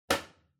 Washer Front Load Filter Lower Cover Close

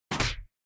Motion Grab Dry; モーション 掴む07-1(ドライ)

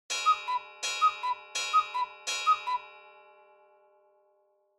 Cuckoo Clock Bell Long

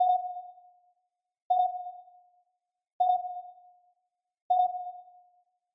Crosswalk Signal Loop

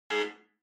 Electromagnetic Buzzer Room

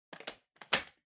Vacuum Cleaner Head Attach; 掃除機; ヘッド 付ける

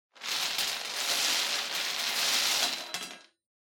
Bingo Machine, Spin Drop

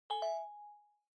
Smartphone UI Sound Speaker

